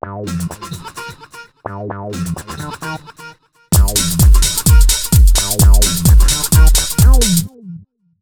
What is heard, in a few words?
129 ALL, Fresh Disco House; USB Soundscan vol.20; AKAI